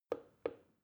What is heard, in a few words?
plastic button start click soft slow